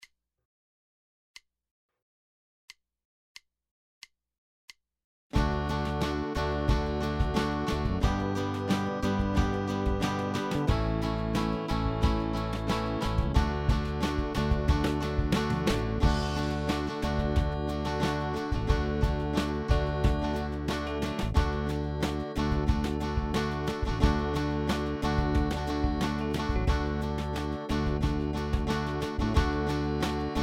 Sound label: at 90 bpm